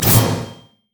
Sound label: riposte; LoL; fiora